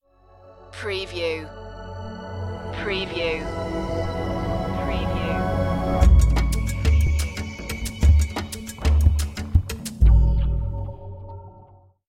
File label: jungle stinger